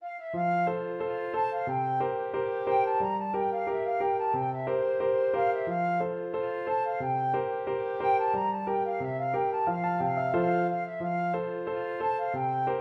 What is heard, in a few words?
hornpipe, flute